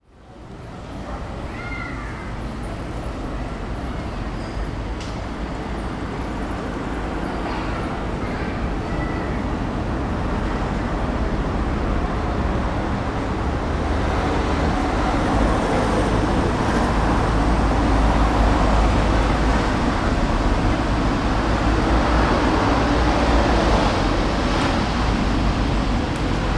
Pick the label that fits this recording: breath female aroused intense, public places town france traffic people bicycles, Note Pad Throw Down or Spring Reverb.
public places town france traffic people bicycles